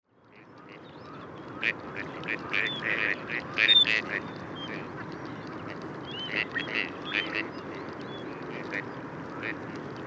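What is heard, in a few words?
gadwall voice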